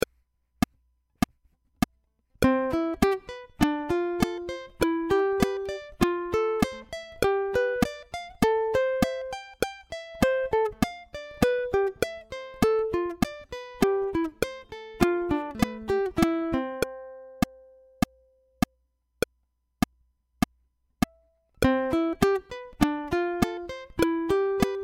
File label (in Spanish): C Escala Back Click